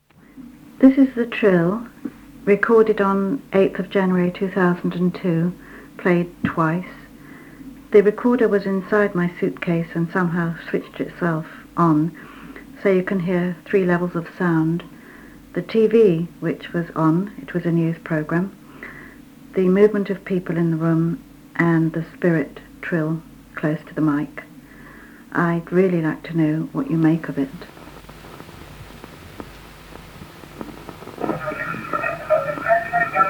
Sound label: Piano trill